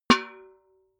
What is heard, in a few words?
metal bucket hit ring soft